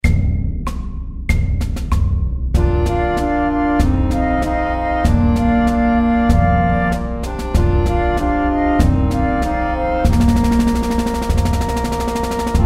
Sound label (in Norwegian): Aspirantmarsj MEDIUM 96